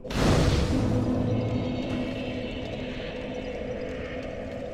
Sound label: bonfire lit; Dark Souls III